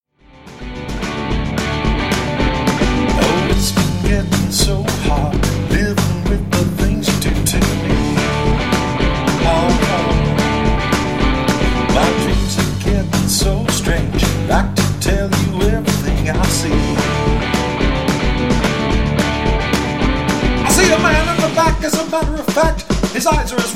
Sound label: cover band; Omaha